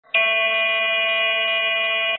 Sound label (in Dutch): snaar 5A; tuner; A-tune